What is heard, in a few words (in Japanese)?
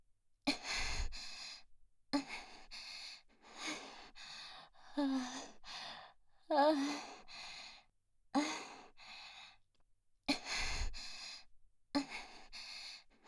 吐息